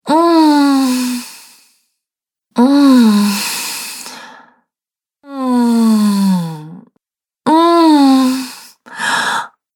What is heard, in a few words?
Woman Sensual Moan Collection; Sex Sound Effects